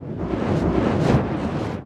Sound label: damage hits explosions, Warships